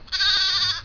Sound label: baa lamb